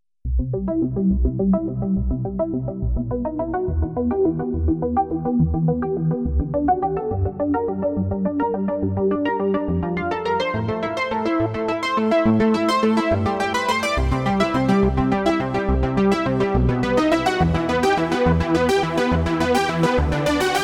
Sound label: saw